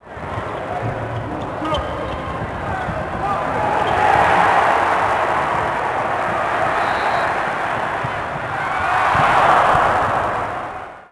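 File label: TV basketball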